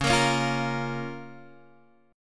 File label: Db 6th, Guitar, Open G